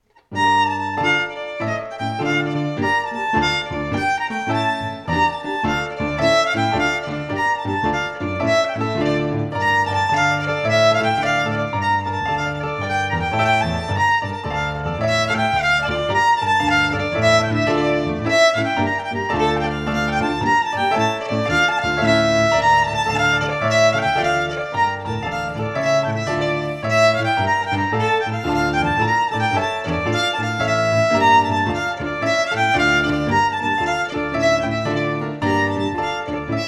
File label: FIDDLE PIANO